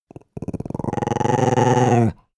dog small growl stutter; natur-tiere; hunde

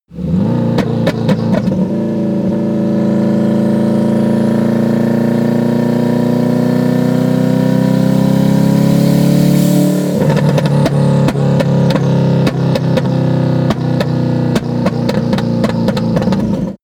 car vw t1 idle acceleration deceleration

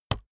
keyboard laptop enter push hard

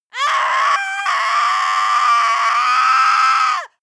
female scream cry pain

